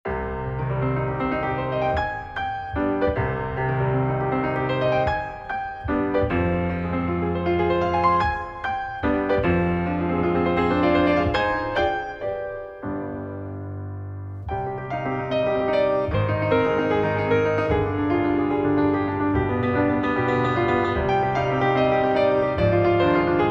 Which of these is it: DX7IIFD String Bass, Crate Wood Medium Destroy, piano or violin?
piano